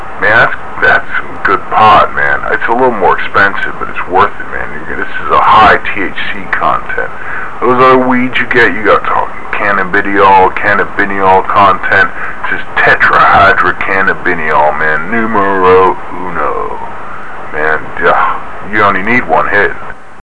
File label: phonecall